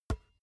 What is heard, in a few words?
basketball catch finger tips hard